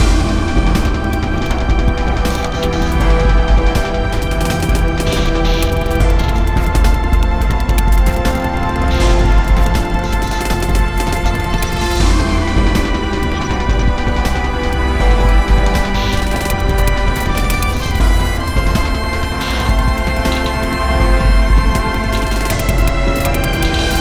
Steelworks Medium; rive wreck hack die retry; ps4

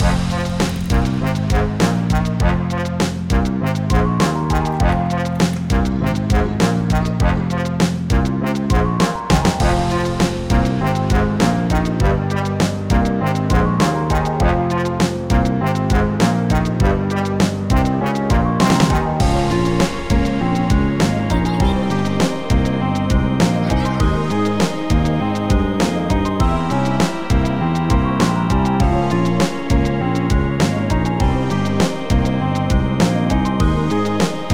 (Spooky Mix) (Instrumental)

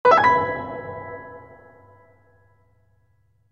Silver Coin; Newer Super Mario Bros. U; Wii; Shop Collectible Idea

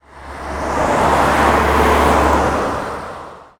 Car bonus sound; Blastmorph Pinball; xbox series xs

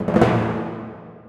Timp rollin; Torchlight III; PS4

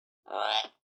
Macaw Idle action; talking tom gold run gamerip 2016

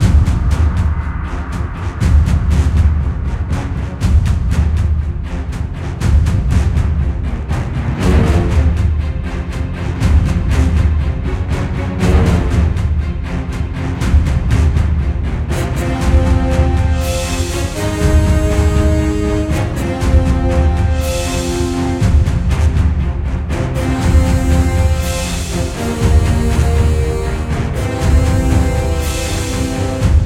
gathering of the dark hordes 1 min loop

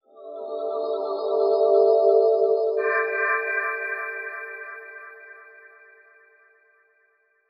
Warp

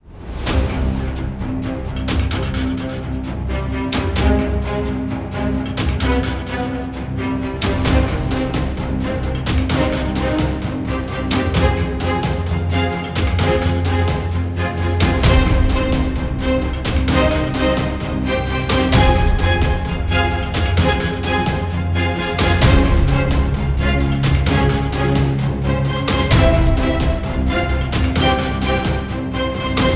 Epic Battle; Dual Blade: Battle of the Female Ninja

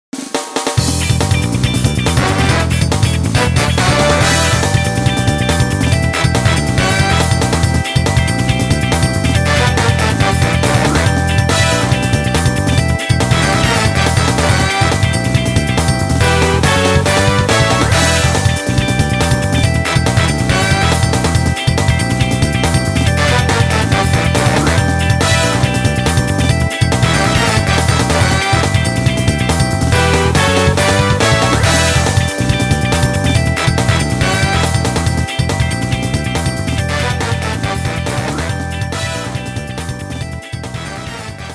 Music Menu event

